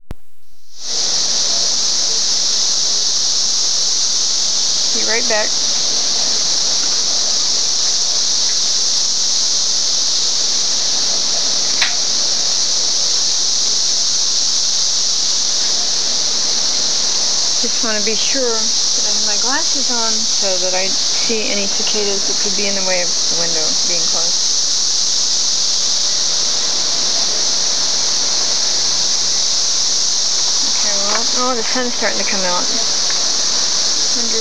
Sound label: cicadas